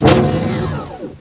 open; rott

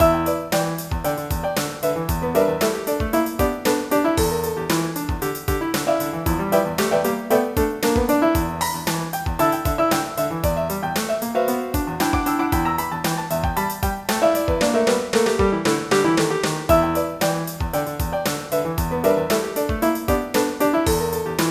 jazz

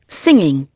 singing

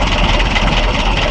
htrack idle; Army Men TiS

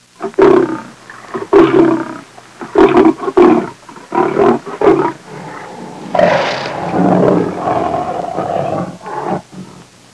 lion; Sound Effects for Multimedia - Volume 1

